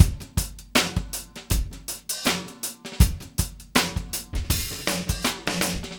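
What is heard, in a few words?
80 bpm, beat loops; Zero-G; Monster Beats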